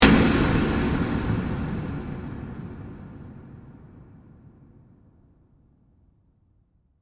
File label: Horror Hit Dark; Hit Sound Effects